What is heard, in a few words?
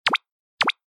Motion Pop